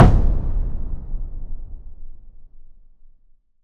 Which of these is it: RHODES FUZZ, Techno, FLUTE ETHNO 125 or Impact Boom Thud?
Impact Boom Thud